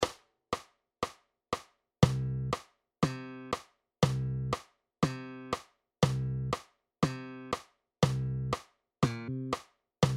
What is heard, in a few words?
Play Along 120 BPM